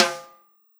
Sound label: DRUM; DRUM SUGAR